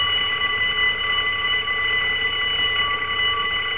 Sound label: siren